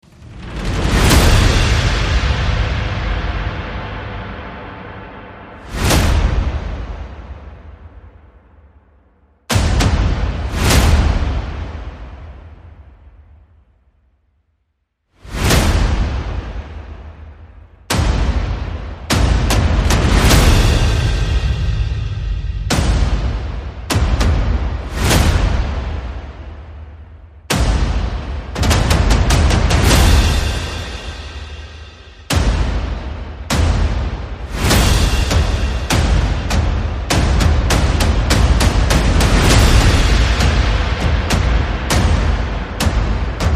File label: Percussion and Effects